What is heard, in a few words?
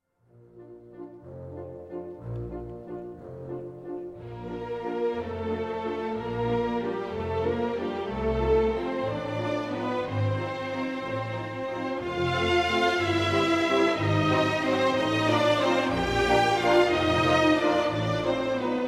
Waltz; Sleeping Beauty